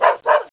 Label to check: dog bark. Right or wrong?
Right